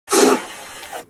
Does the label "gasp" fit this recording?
Yes